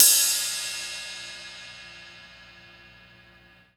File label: Cymbal With Rivots, Lounge Single Drum Hitz